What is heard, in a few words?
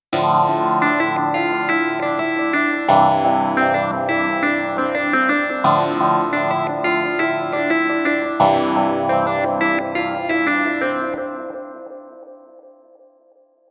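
Yamaha Reface CP clavinet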